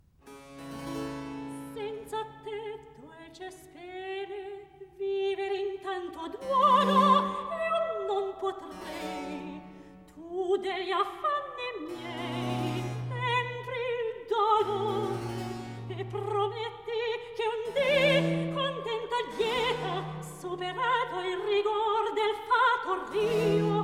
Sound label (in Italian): Recitativo; Crudel tiranno Amor HWV 97; Senza te, dolce spene; Handel Masterworks; Klassika